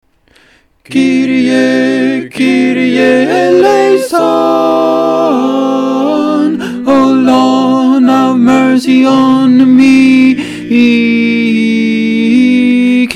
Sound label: Kyrie alto